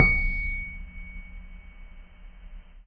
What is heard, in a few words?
C#7 PP, Piano cp80